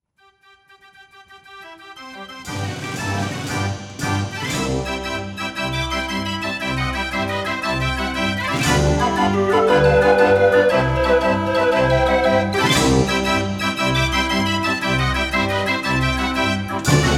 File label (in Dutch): draaiorgel; de klok cinema royal